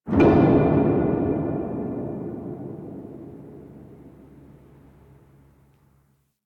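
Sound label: Ocean Distant Bang; Ambience; Warframe; Grineer Ocean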